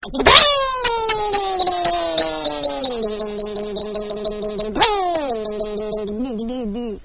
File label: Stalling 2 Stroke